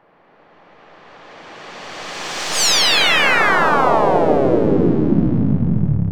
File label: TELEPORTING; sloodle